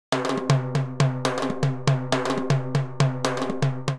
Timbales; Latin Percussion; Instrument Kits; Ableton; Factory Packs

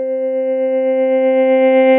Guitar - Reverse FX; Guitar Amped; Guitar and Bass; Ableton; Factory Packs

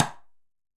Timbales L Stick, Latin Percussion; Drum Hits; Ableton; Factory Packs

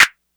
snappy; analogBully; Ableton Foundation FreePacks; Wicked Kits

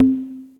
Bongo, Retro Synths; CZ LectroBongo; Ableton; Phazeform Vol 1

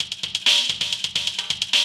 Dubstep 70bpm, MIDI Drum; Ableton; Factory Packs

